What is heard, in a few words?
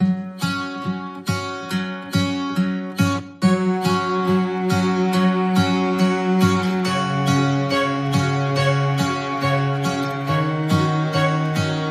Joyful Acoustic Christmas No Drums